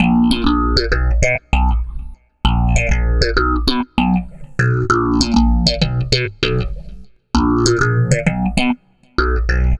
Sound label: Processed Slap Bass, Electric Bass